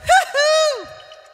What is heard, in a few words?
hoo hoo SUNG